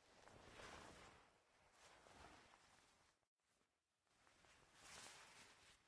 Make Coffee; MiSide

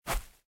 Step Grass; MiSide

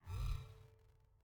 Ring Move; MiSide

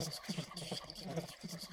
Chibi Player Voice Sad; MiSide

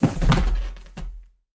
Player Fall; MiSide

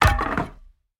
Bat Fall; MiSide

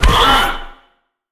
Spider Put; MiSide

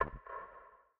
Change Case; MiSide

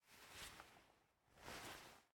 Drop Glasses; game audio; Mila; MiSide

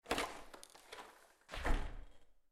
Enter Door; Game rip; MiSide

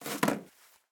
Smartphone Drop; Game rip; MiSide

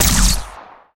Shot; MiSide

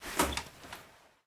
Open Door; MiSide

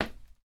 Dance Step; Game rip; MiSide

